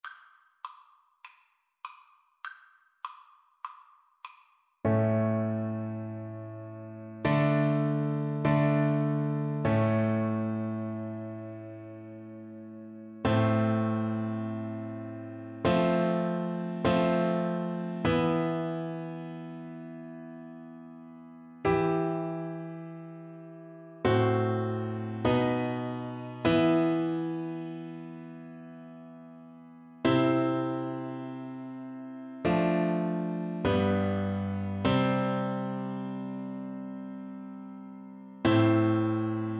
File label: Viola